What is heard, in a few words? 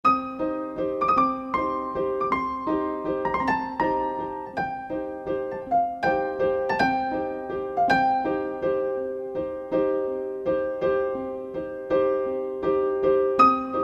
Alesis QS8, Antique Pno; Factory Preset examples